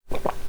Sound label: Drink